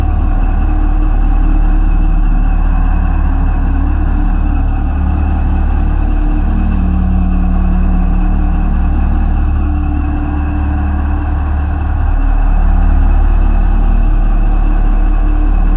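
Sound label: tanks loop; ambient; combine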